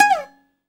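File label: bouzouki glissando down G 3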